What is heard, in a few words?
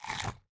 Raptor Bite Sounds